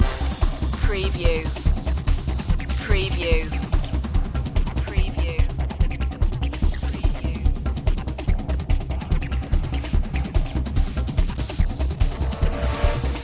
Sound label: urban takedown loop